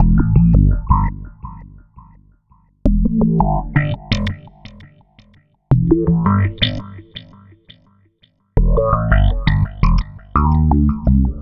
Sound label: Processed Slap Bass, Electric Bass